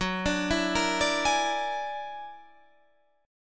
F sharp Augmented 9th F sharp Guitar Half Step Down